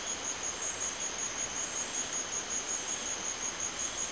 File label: Jungle Loop